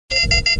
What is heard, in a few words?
paranal software comp error, mono; soundscape